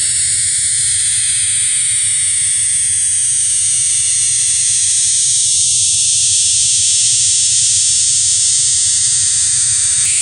jammer rf usb; jammer 1 rf usb

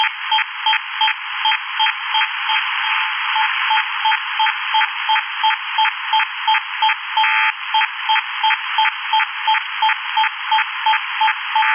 link-11 clew ssb

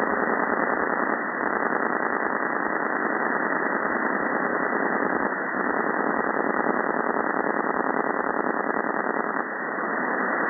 SuperDARN

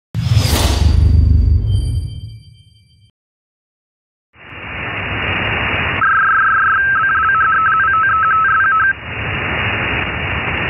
buoy f1b

